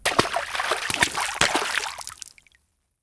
BRUIT eclaboussures

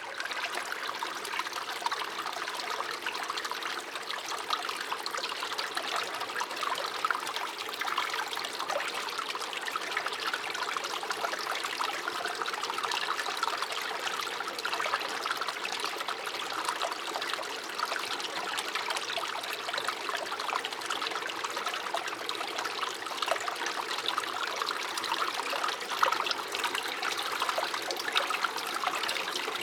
BRUIT Eau coule

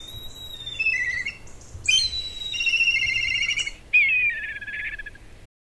BRUIT milan noir

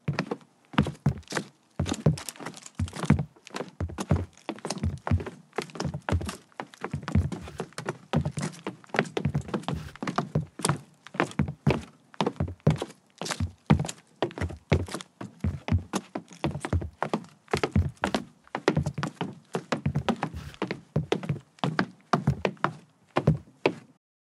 BRUIT pas sur bois

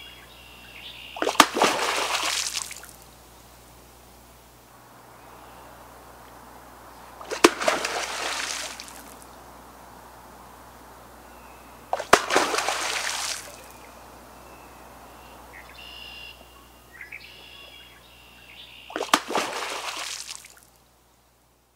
BRUIT castor queue

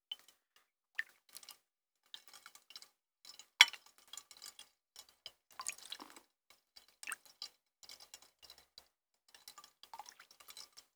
Thermes

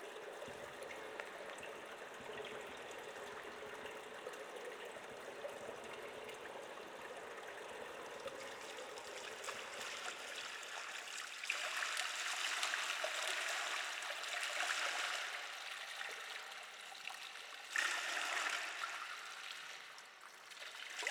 AMB Thermes

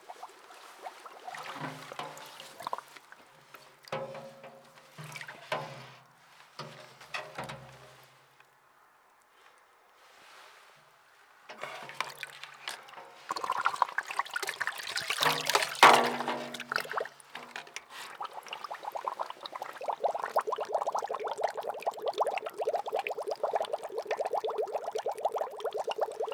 Mecanisme pompe

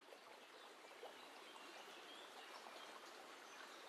Eau qui coule

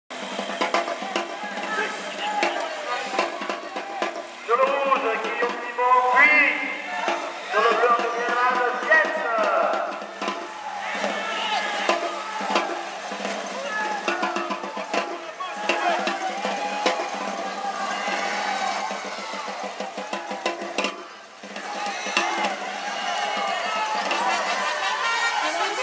AMB Combat joute; AMBIANCE; ACTIVITES FLUVIALES ET NAVIGATIONS

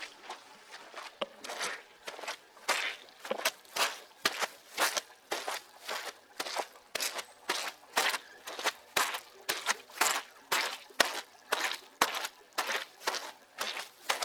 Pas boue

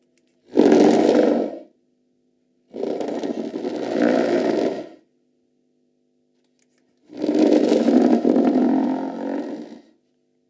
monstre

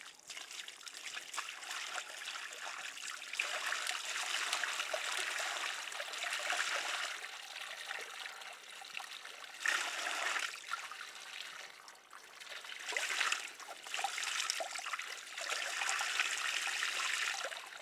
BRUIT Eau canalisations

